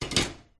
household teapot vintage brass set down on oven hob